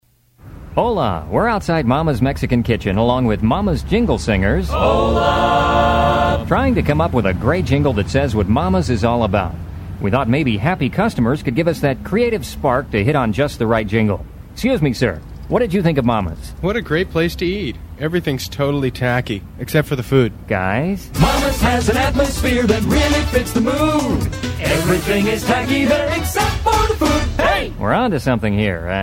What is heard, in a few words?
Jingle Singers; Mama's Mexican Kitchen